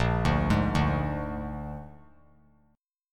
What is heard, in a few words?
Bb 7th Bb down 3C,3F,3A,3D; arpeggiated; JGuitar